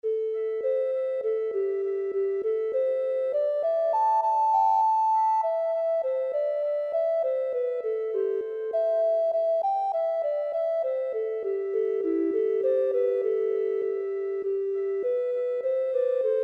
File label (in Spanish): Un poquito cantas flautas ALTO O SOPRANO, dos flautas soprano y alto; 7º-8º Música Primavera, 7º-8º Música Verano